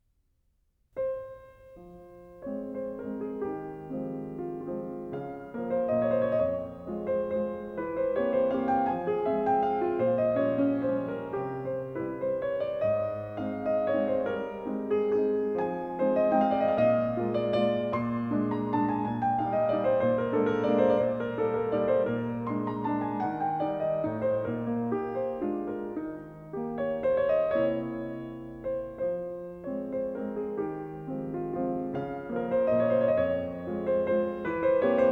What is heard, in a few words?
Waltz #12 in F minor-A flat; Op. 70 No. 2; CHOPIN; Nikita Magaloff